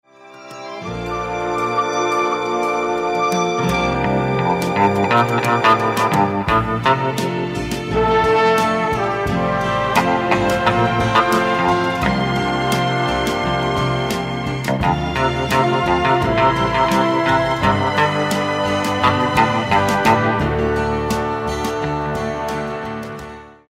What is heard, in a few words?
lineman solo